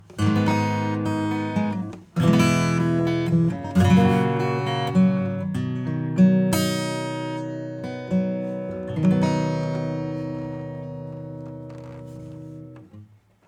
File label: Spectre acoustic